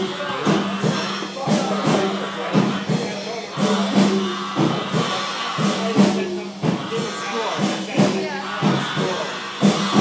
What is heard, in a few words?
Japanese music